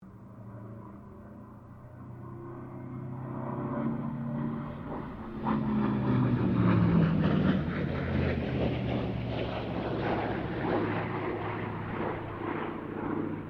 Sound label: AIRCRAFT TROJAN SQUADRON 4 PASS DISTANT; Mixed aircraft squadrons; Airplanes; Vehicles